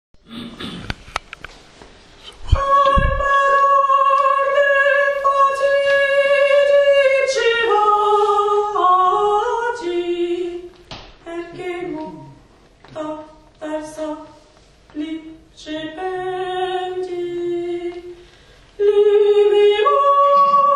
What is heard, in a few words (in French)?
sopranes; nabucco